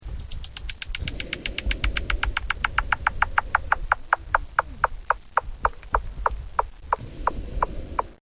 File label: weddell ringtone, weddell seal